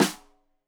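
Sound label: Snare Stereo, Drums; Deeply Sampled Drums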